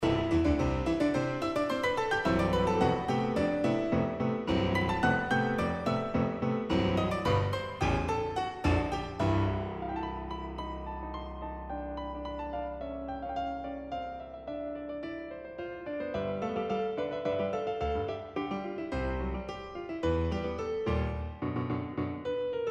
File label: Piano sonata